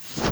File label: zoop